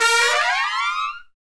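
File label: Brass Trumpet FX; Tpts; Roland L-CDX-03 Disk 2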